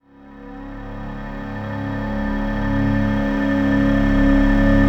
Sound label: Breakdown Fast